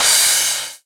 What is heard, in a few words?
crash